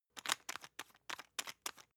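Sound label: toothpaste open loose, Bathroom; haus-garten; badezimmer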